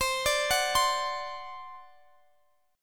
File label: C Suspended 2nd Flat 5th C down Guitar DADGAD; Csus2b5 arpeggiated